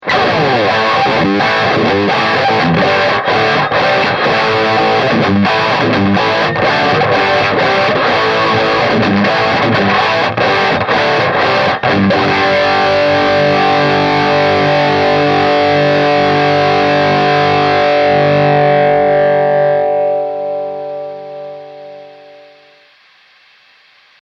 Lead Full

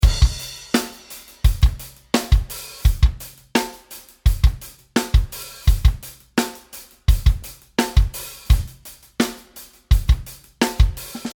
Drumbeat SSL EQ

